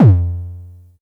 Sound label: High Kicks F2; LIMAT Div Dept; Drums